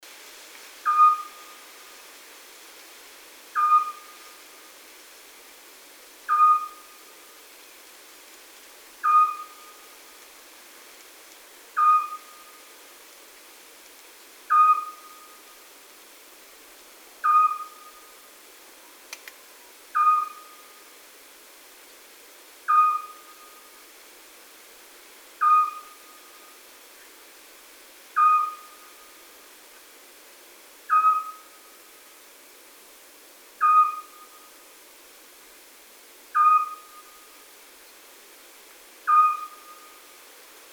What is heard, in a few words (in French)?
Hibou Petit duc; chatillon